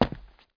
kyle run; MOTS

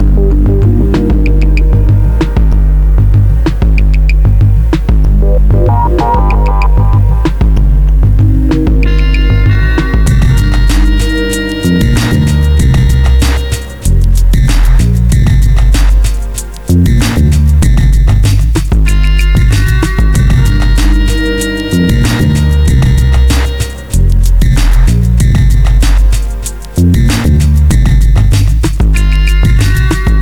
kitchen; 8 BIT pro

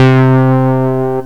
Bass & Lead; gmplay; synth lead

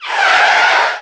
skid

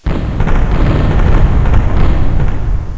FIRE; agesail

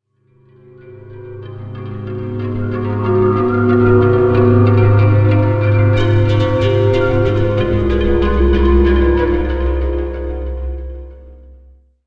loop

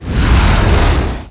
ERUPT; ShadMast

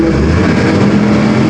movement; soldats du feu

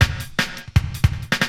DRUMLOOP D+B 160, Drum+Bass Loop; eJay

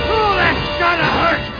v hurt

